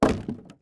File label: environment object drop wood